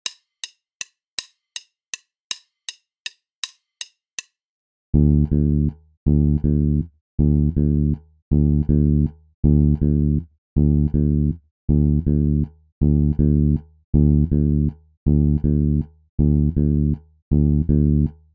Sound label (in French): decalage triolets exercice basse tempo 080; exercices